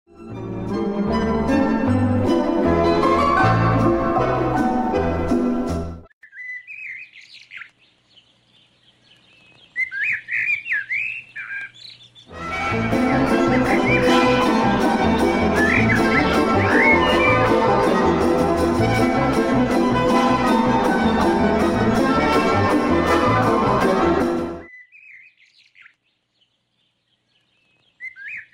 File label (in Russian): Мелодия